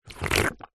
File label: human drink slurp soda from can single